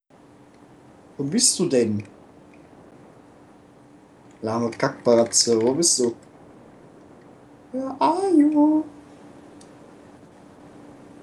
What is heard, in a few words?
HAHAHAHA